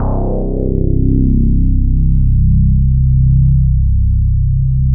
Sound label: MKS BASS